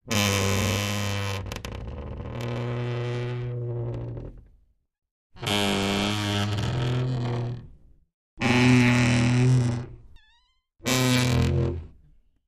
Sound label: Door Wardrobe Creak; hinges & creaks